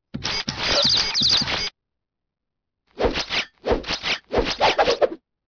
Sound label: robot bird